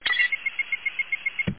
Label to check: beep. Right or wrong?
Right